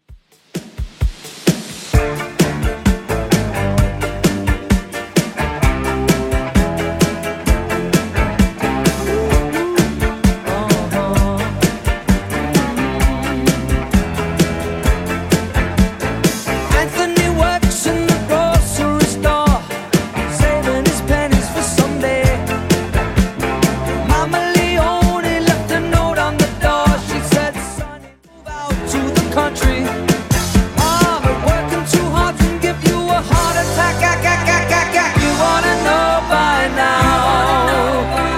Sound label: 70s Redrum 130bpm